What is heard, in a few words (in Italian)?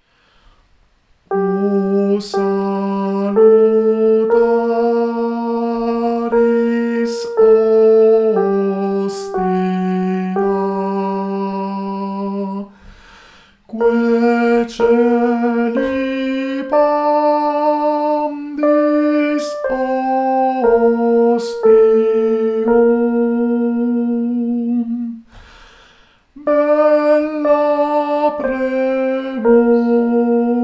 Soprani; O SALUTARIS HOSTIA